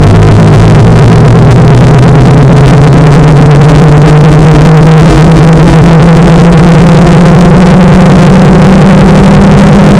Loud Noise Intro Loop; baldis basics

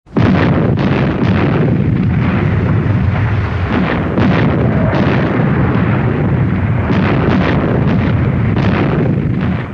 16 inch guns; hitler speaks

